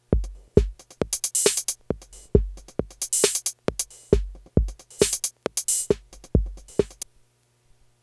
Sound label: 808 mode